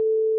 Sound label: airhorn